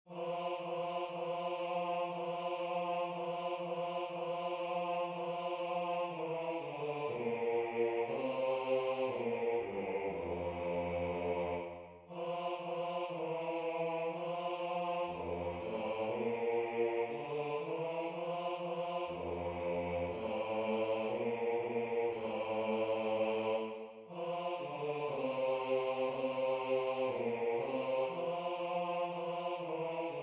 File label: Bass